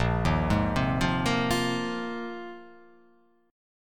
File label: A sharp 7th Suspended 2nd Suspended 4th